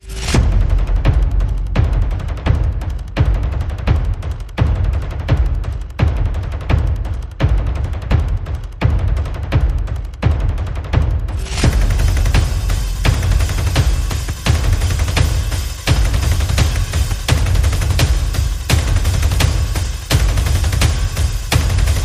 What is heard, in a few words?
Percussion and FX